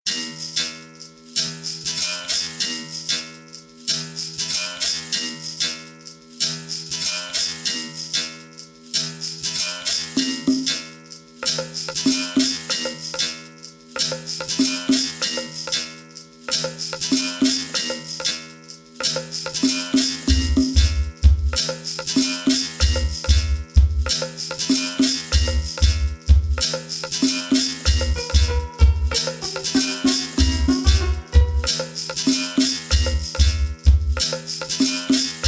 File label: sensual rhythm, 432 Hz; heaving belly; Falujący brzuch